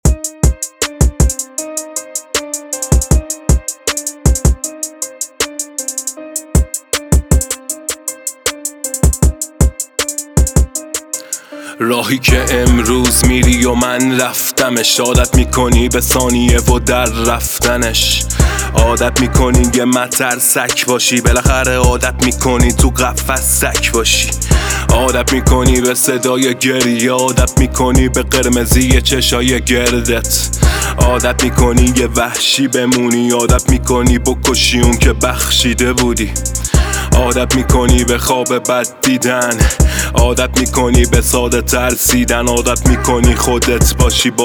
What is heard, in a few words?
Trap Vocal